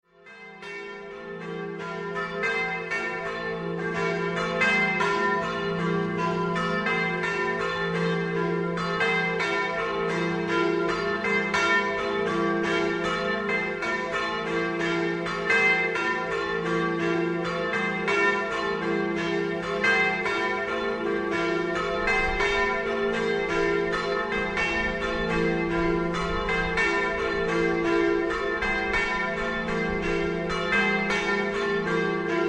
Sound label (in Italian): MORSANO DI STRADA, campane